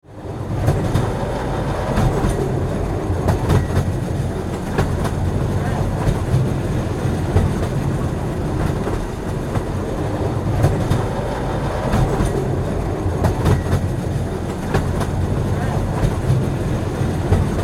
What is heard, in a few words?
train